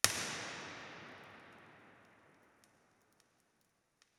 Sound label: IR MLS, Dodecaedron; IMP-RESP; Bergamo